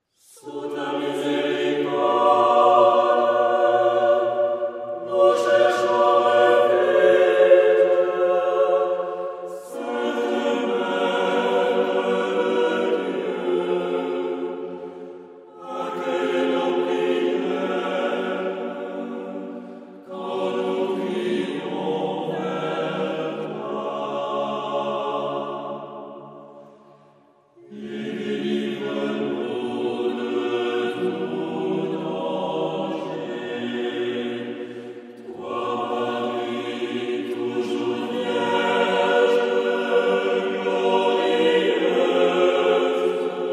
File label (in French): ensemble vocal sous ta misericorde; capella sylvanensis